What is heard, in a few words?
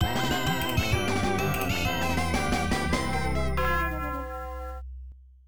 Game Over BGM; asterix arcade